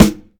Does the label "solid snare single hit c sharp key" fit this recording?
Yes